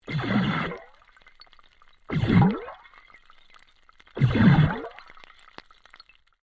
Underwater Bubbles Burst Small Sequence, Air released from compressed air cylinder; Nature